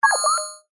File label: science fiction computer burst beep tone